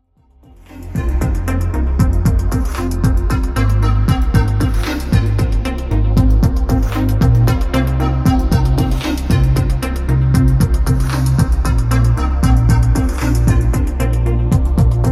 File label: Pulses